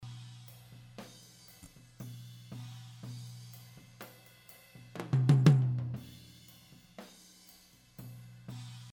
Tom Mid

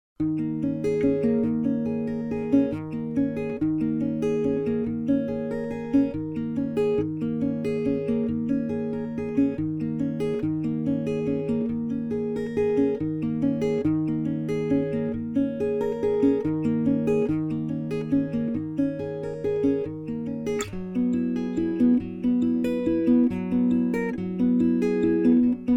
Acc Guitar SM81

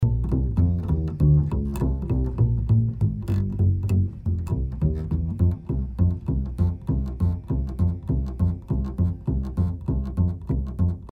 Upright Bass F Hole

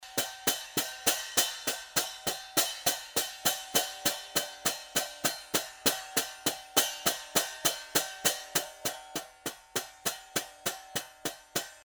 Cymbal Stereo

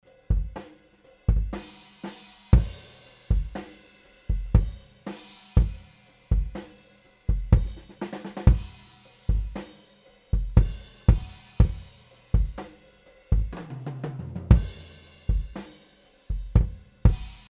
Kick D112

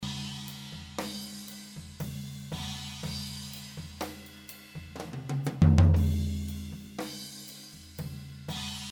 Floor SM

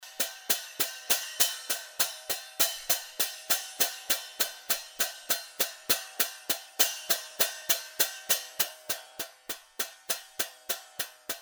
Cymbal Center

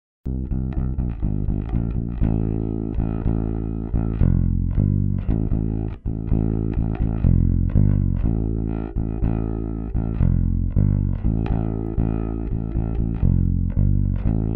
Bass Sansamp

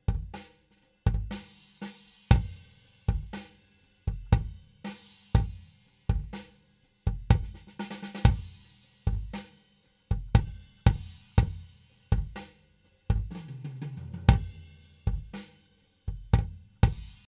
Kick U47fet

